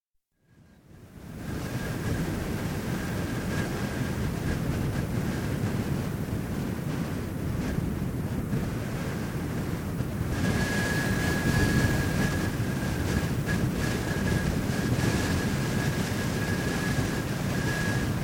Blustery Wind on a Beach, with drifting sand (No seawash); Outdoor Sound Effects; Seashore; BBC